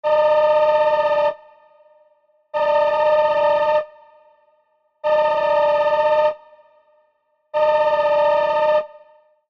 zvuk starogo telefona

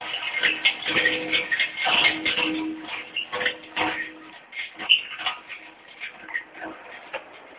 raznoobraznie zvuki bryacaniya metallicheskih predmetov

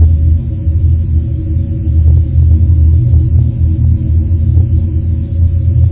gudenie donosyasheesya otkuda to snizu

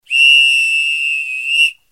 svistok sudi ob okonchanii matcha

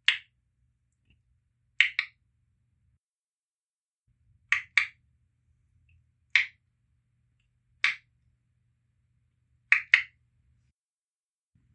tsk tsk rot yazik shelchok

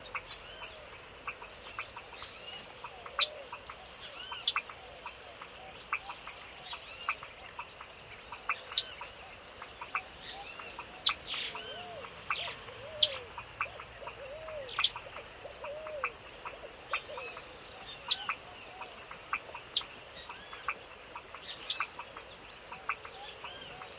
raznorodnoe shebetanie i chilikanie ptic

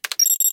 korotkij zvukovoj shelchok

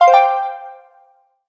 korotkoe uvedomlenie

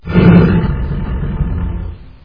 groznoe richanie golodnogo tigra

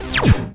knopka cifrovoi rezkii visokii blizkii aktivnii

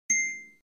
legkij zvon hrustalya

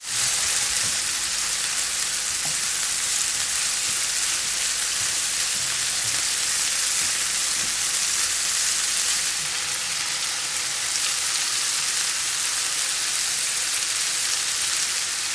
gotovka na grile solenie

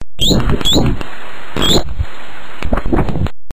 kitajskij belij delfin

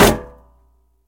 moshnij tyazhelij udar po metallicheskomu predmetu

